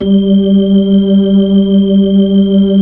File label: B-3, Brite